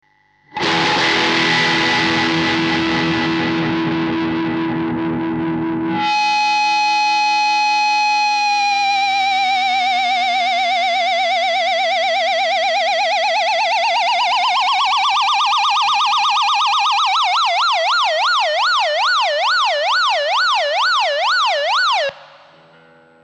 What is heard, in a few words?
MAXON PT9PRO+ Feedback